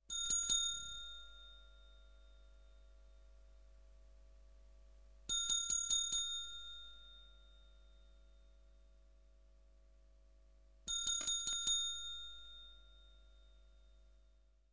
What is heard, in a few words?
Bell Hotel; KMP Film Video Sound Effects